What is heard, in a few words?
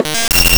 Drums, MARCHING; 3D Drums; OP MARCHING